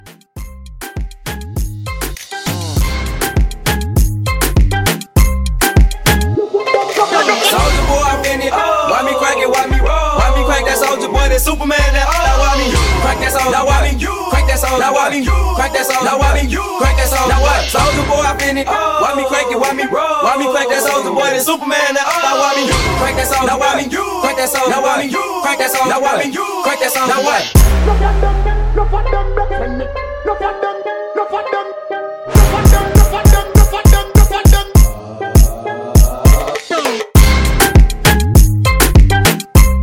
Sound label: Moombah Intro Rework 100